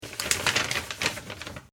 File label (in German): office papier